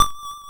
Vibra D3; multi-samples; Vibraphone; CHIPSHOP DELUXE

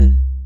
Tom, techno drum

